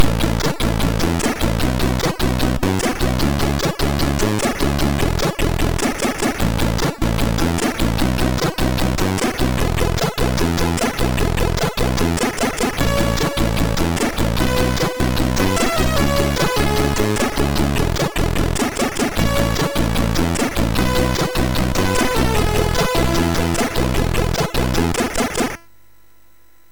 Simple Sample, sid MOS6581R3

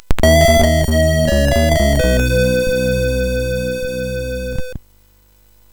sid MOS6581R4, GAMES; Dark Caves

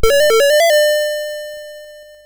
Buggy Boy, CSG8580R5

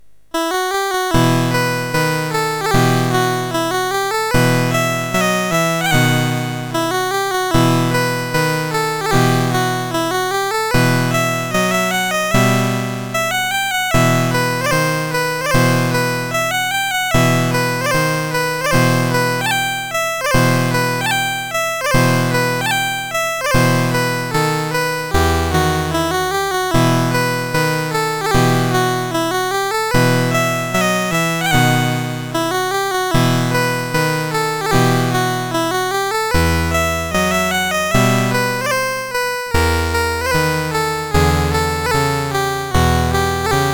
Tambourin MOS6581R2

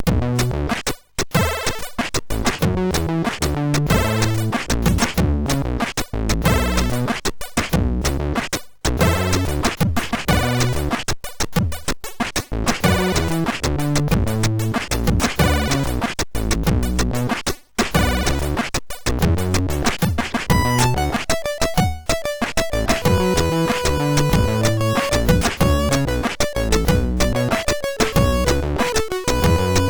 Hurtig, MOS6581R4

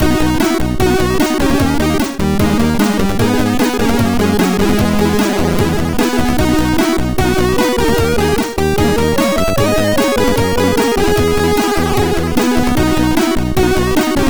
Intro, sid MOS6581R2

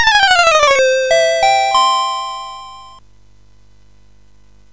Solomons Key, MOS6581R4

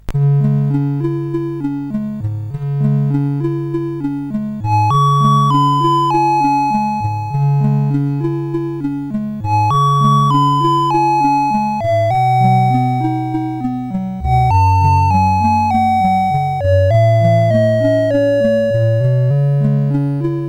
CSG8580R5, GAMES; Snowman Mr Freeze

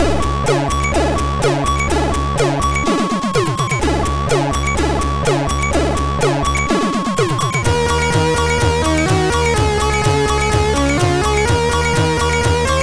Cool Mix, MOS6581R2

